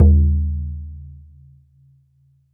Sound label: BODHRAN, Celtic Percussion; E-MU Producer Series Vol. 7 – Old World Instruments